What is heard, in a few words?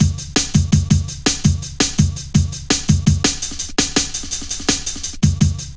Fat Rolla, Jungle Drum Loops 166.5; Zero-G Jungle Warfare 1, 2 & 3